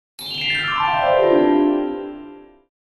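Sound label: Cartoon celesta descend gliss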